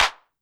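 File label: Hand Clap Analog; YAMAHA RM1X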